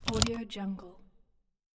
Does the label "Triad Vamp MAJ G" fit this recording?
No